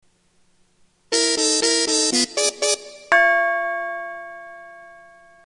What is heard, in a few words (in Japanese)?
quiz hazure